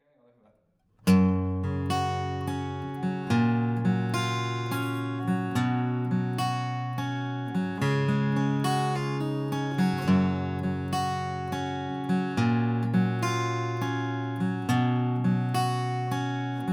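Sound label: guitar pick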